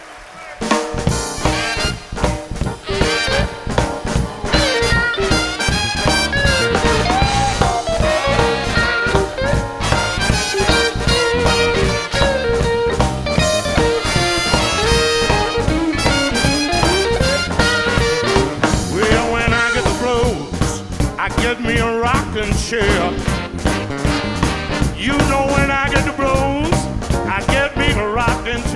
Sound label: jazz blues